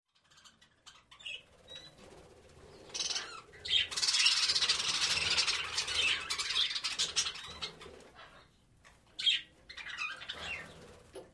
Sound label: Pet Store Interior W High Pitched Parakeet Singing And Wing Movement.